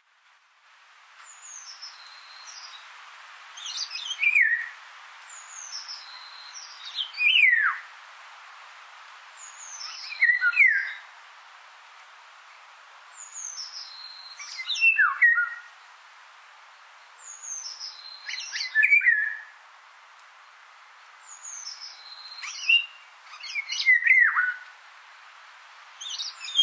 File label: indian golden oriole